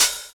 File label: Open Hhat, Hihat; Equipped Music; Loungin House